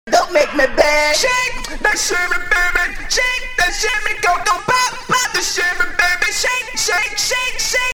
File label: Autotalent